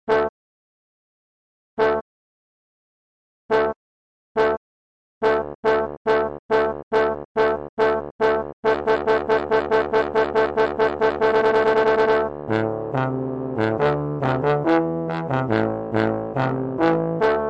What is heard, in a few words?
samba